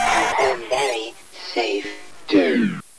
zombie alert

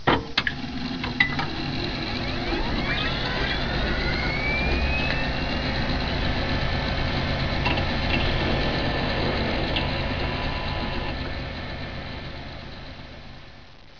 bootup com, ambience; caged

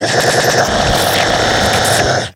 zombie alert; custom monsters; glitchzombie

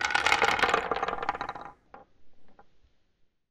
reset

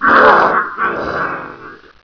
pain; zombie barney

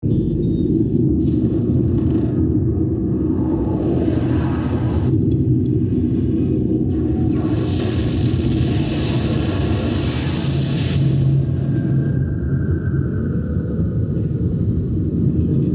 Alien amb; Resurgence

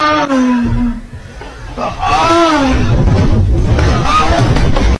dying

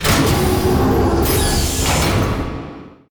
door

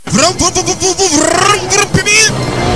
vroom

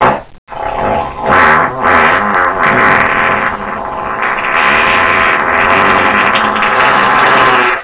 fart on door

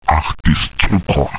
pain; boomario

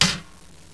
metal, debris

